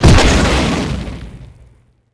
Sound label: laser Hit; impacts explos